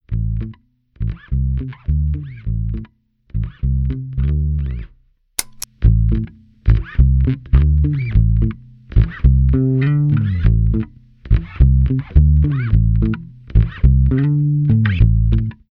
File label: BASS